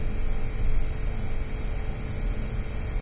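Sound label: hotel refrigeration loop, electrical; video game; source engine; portal2; ambient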